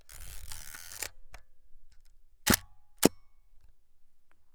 ARMEMENT-DECLENCHEMENT

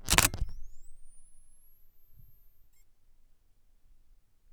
CHARGEMENT FLASH

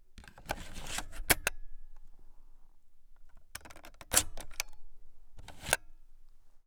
INSERTION-EJECTION DISQUETTE

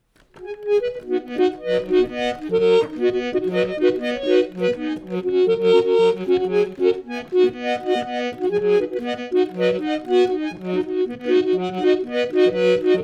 Ariston Organette

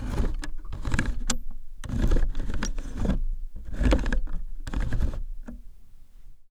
MISE AU POINT

